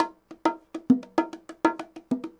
bongos 100 bpm, latin; Sound Effect Library

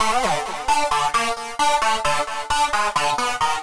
Lead Synth

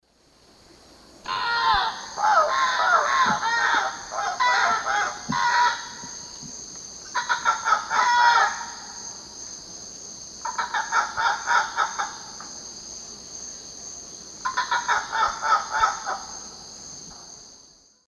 Ibycter americanus, calls group; Eastern Lowlands

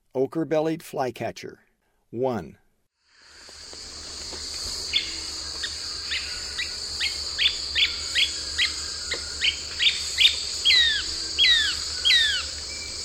Ochre-bellied Flycatcher; S. America; Eastern Lowlands

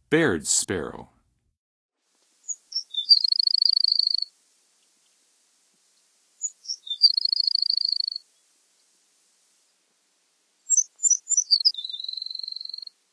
Bairds Sparrow, Songs of Birds of North America; Western USA; Western USA Songbirds

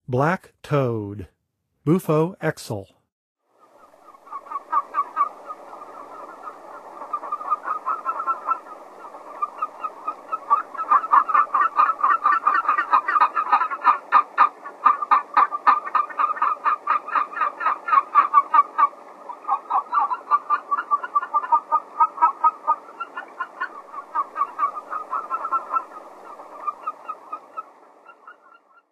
Black Toad; N. America; Songs of Frogs & Toads of North America

